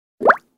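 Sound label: bubble sound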